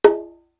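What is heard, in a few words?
dynamic C, drum kit; East West; Global Sounds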